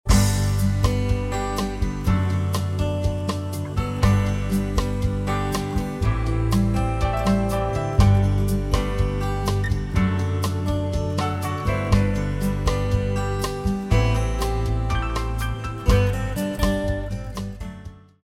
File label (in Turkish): santral fon muzikleri